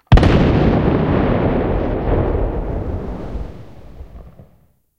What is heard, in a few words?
cannon 100 122 tail far; guns; tanks; War Thunder